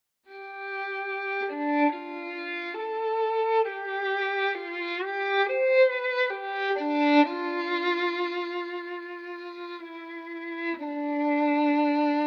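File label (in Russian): keltskaya melodiya, ringtone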